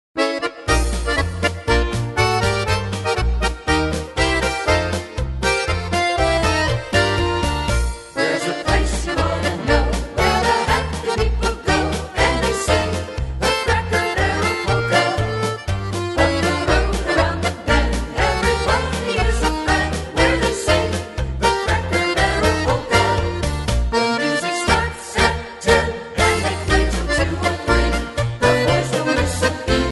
Polka

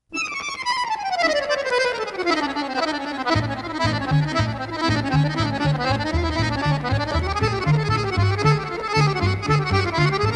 Boogie, Accordion

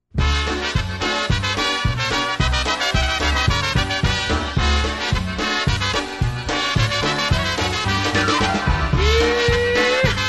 Polka

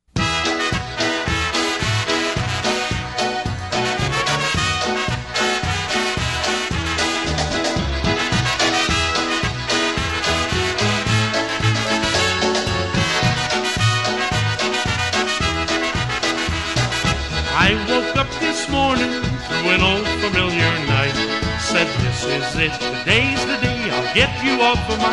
Polka

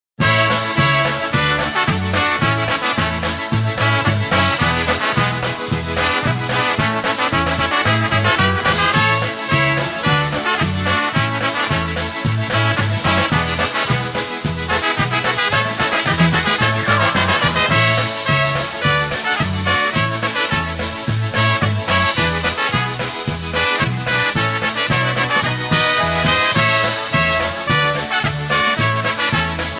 Holiday Polka, Push Style

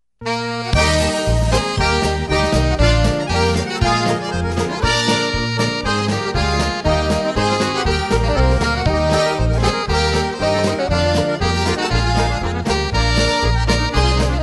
Christmas Tree Polka

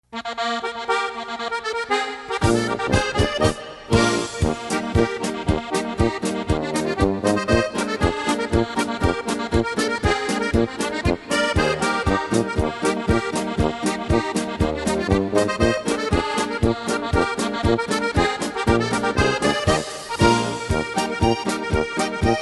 Polka, Button Box

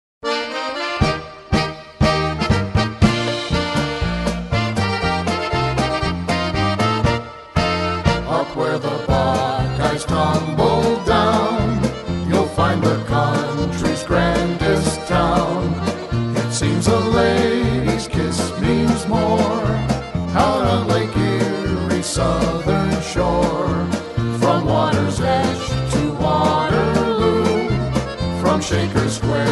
Polka Medley

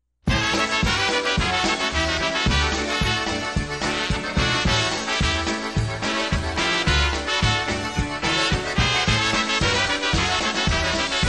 Polka

